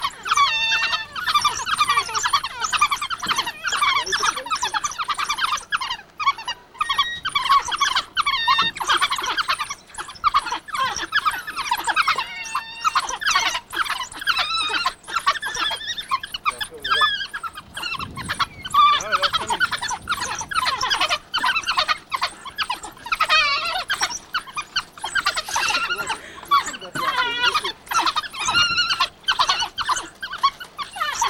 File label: African hunting dog